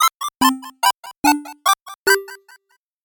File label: 8bit jingle fast